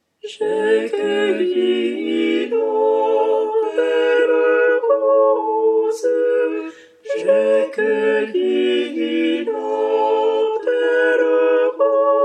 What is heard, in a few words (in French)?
sopranes; J'ai cueilli La belle Rose